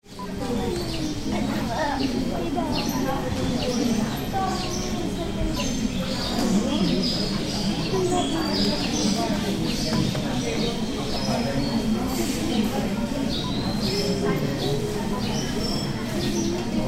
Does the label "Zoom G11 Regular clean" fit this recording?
No